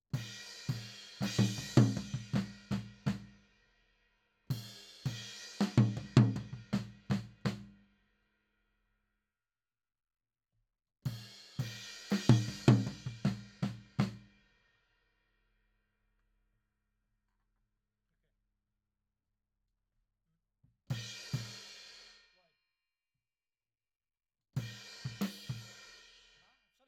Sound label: Tom